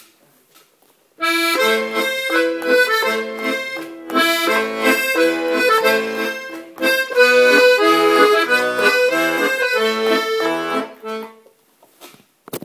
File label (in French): avec registre musette, accordeon